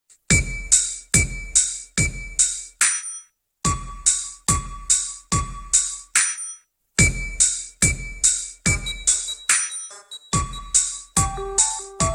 Beat Blocks Theme

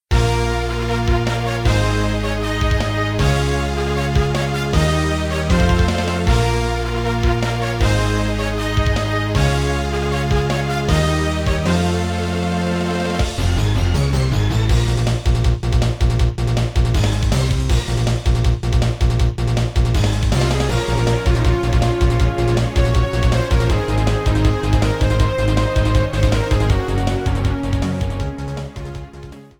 Battle Theme; WMOD; Terrormisu

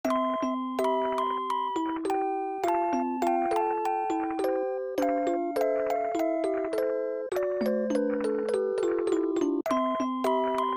Story Music Box

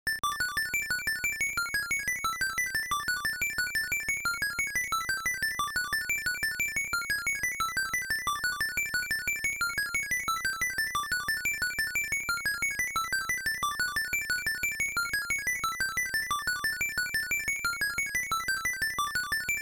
Turtle Zone BGM; SML2

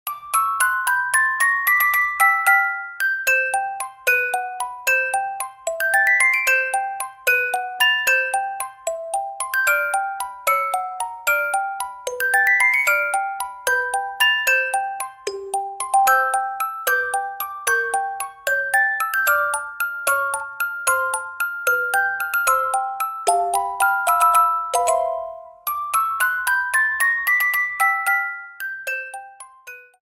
Underwater Night; SMM2; SMB

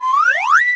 Attack FX sound effect

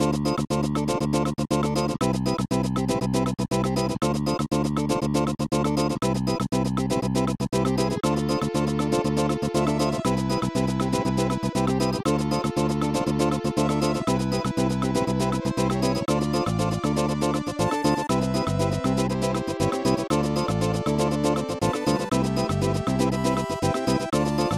Powerful Infant; SMW2YI

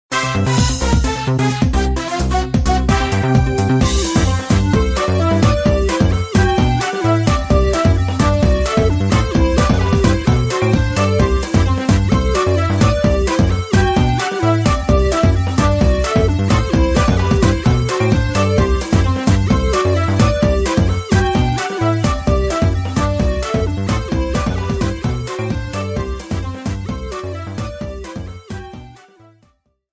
Minigame Draw; MP8

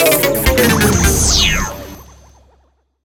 Minigame Over Early; SPM

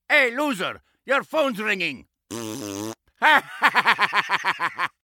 Wario Loser Ringtone

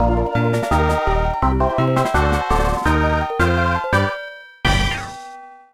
Music All Clear!

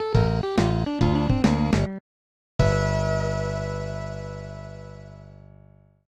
Game Over; U30SGM

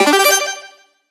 Item Decide; MK8DX; Waluigi Pinball

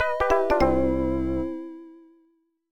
Coaster Hills Lose

